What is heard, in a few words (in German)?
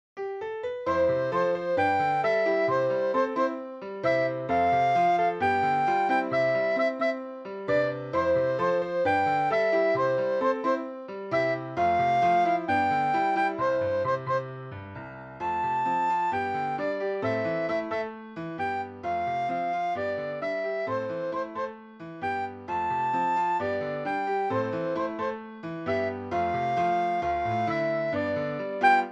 Blockfloete Calypso; Besetzung: Sopranblockflöte und Klavier; Schwierigkeitsgrad: 2 - Einfach; Tonart: C-Dur